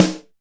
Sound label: Snare, Alesis DM5 Drum Machines